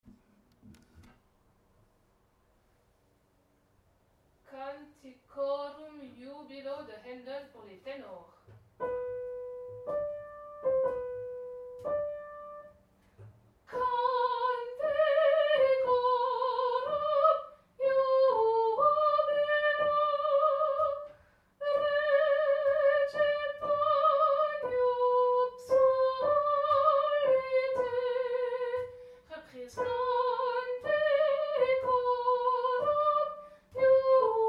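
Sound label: Canticorum jubilo ténor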